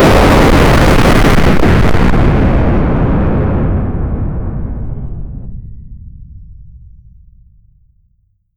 sound of explosion; vibration

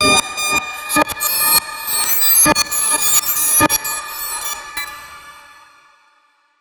make an sound effect of, ship

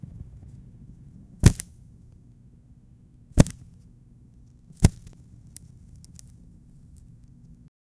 book flip with fire back; library

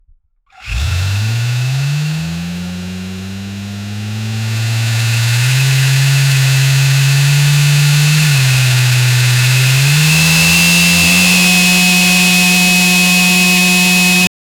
a high performance turbo, spool

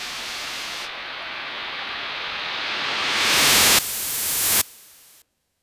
spirit box static

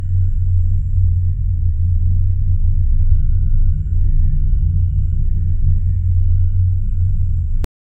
continuous looping sound, mechanism

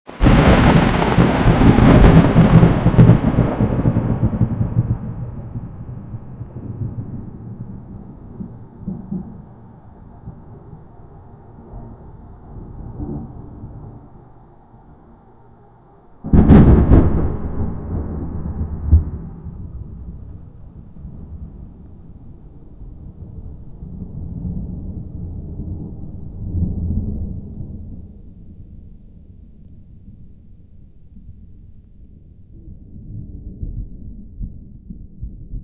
pure realistic thunder so

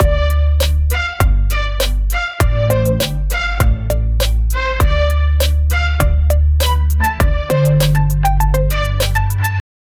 trap beat but use tibetan; ethnic

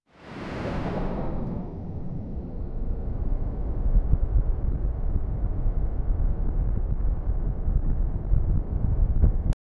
the sound of big ship, collision

